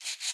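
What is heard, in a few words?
Special Photo Crayon Environment Draw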